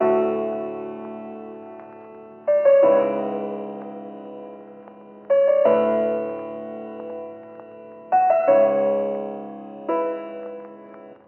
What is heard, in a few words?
168bpm keys